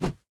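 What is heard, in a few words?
victory portal jump; Smite; Cerberus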